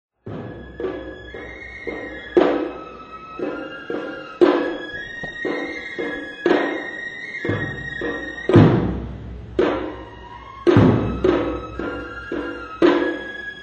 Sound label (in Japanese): シンガク, 宵宮で道行き囃子として演奏される; 半田市; 半田市乙川南山「八幡車」